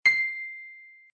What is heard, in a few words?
ding; beatmouse